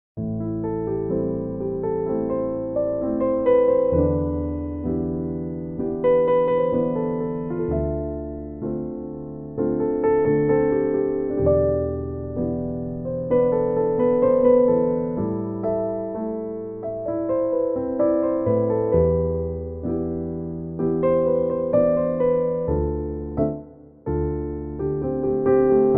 Piano Cover

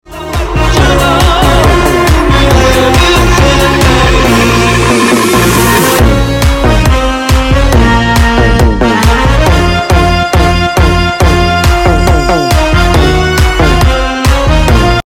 mix remix tiktok

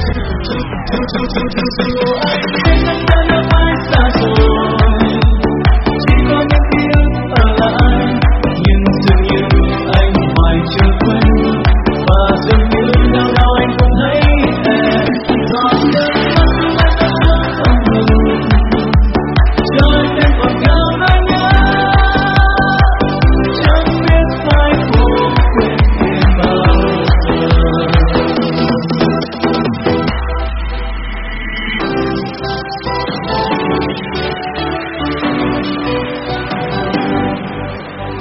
remix; nhac remix

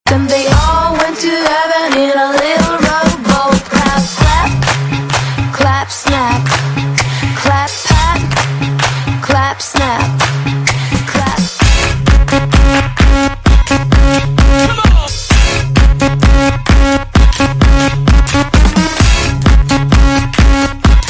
Clap Snap

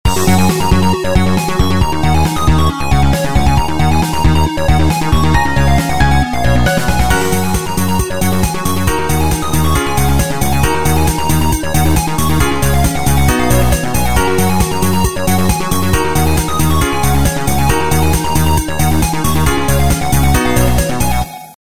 Nokia Swimming; nhac chuong

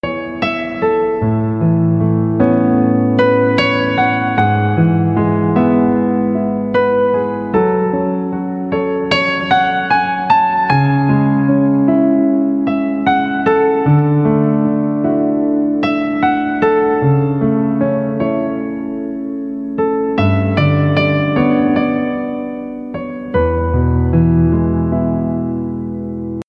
Piano

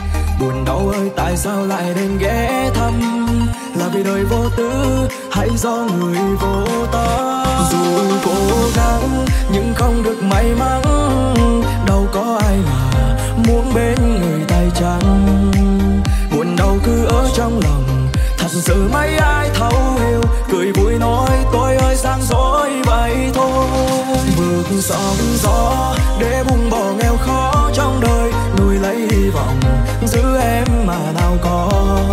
Remix

